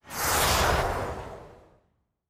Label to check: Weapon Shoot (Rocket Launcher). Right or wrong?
Right